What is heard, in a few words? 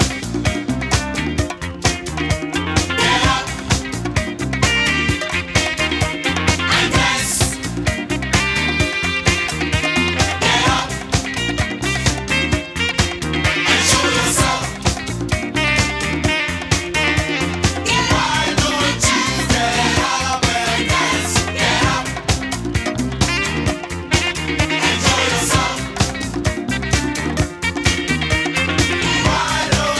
disco